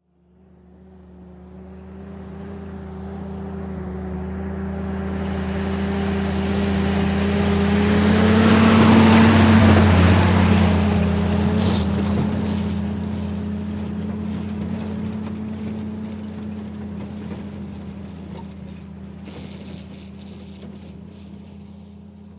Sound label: Army Jeep Slow By